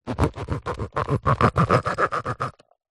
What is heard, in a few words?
foley rubber toy cut into knife